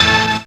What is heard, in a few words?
synth, a, Rave Synths; Best Service ProSamples vol.37 - Dance Synths